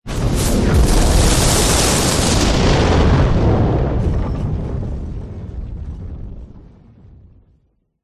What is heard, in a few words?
explosion fire burst backdraft; zapsplat